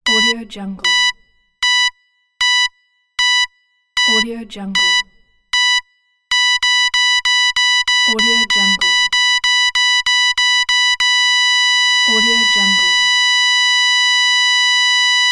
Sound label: heart monitor